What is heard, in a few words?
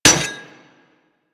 Melee Axe Impact Generic; Warframe; Weapons; Axes